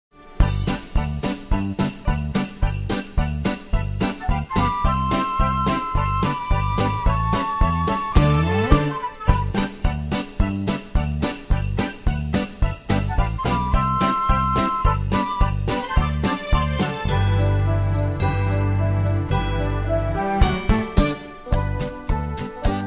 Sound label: instr jingle bells; karaoke; disney; christmas favorites